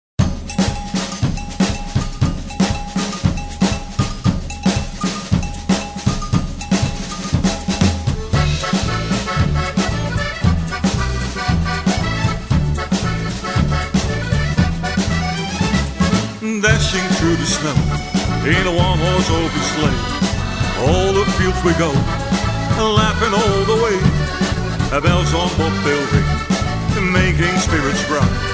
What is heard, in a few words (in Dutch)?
jingle bells kort